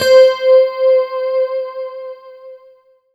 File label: C5, SynthLine; 90 s Sample CDs; NiceBeats; SynthLine VOL-23